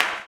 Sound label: CLAP, blues & soul; ILIO; Vocal Planet